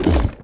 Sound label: UGLY PILLAR hit; Eft